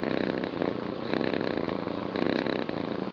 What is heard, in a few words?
kitten purr